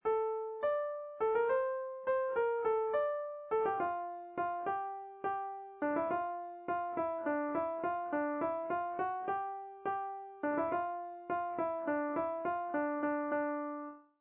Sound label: Polka